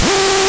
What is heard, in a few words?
door